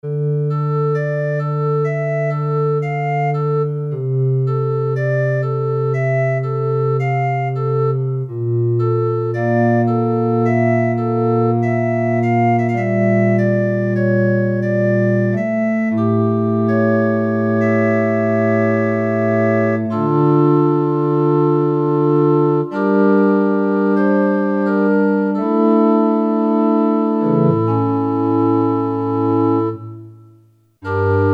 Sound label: CHIFF ORGAN, Roland Jupiter-8; Factory patches